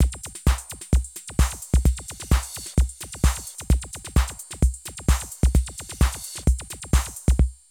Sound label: Drum loops, Euro techno